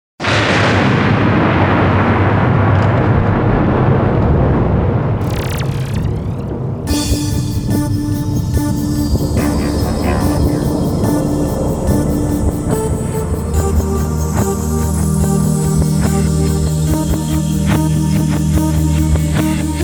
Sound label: trance intro